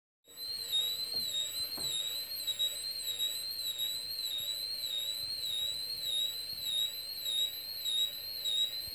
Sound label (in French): alarme centrale